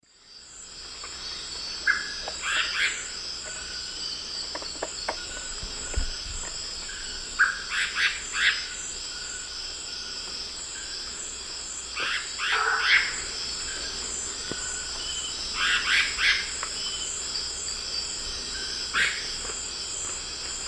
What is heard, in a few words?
Turdus albicollis, calls; S. America; Eastern Lowlands